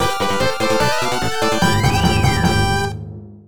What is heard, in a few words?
1-Up; Sonic 3; sonic the hedgehog 3 angel island revisited pc android gamerip